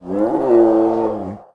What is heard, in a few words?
scrm; The Elder Scrolls III - Morrowind